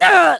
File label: HIT; BattleSNDS